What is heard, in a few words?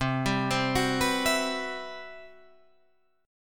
C-Major Sharp 11th, Guitar Drop D